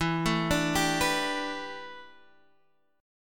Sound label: A 9th Suspended 4th, E